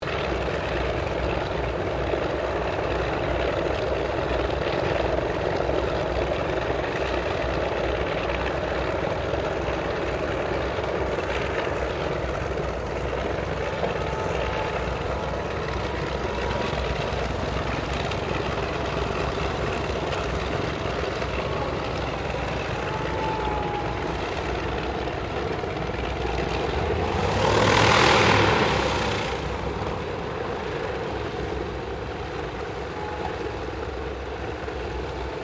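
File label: AIRCRAFT LOCKHEED L-1049 SUPER CONSTELLATION TAXI; 1955; Connie, Super Constellation; Airplanes (Sound Effects:Vehicles)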